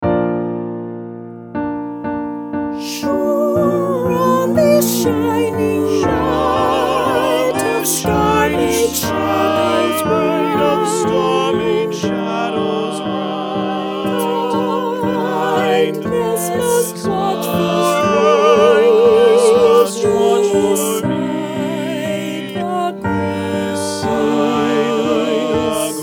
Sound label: Sure on this shining night SATB